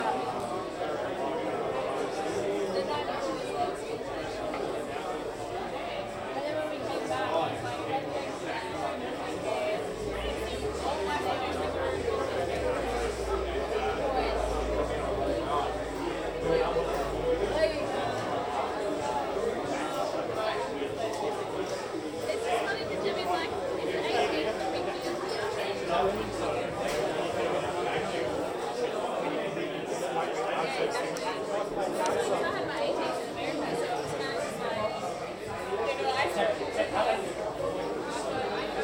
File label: General Chatter In Bar